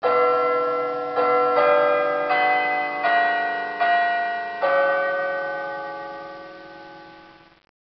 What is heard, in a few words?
clock; mi3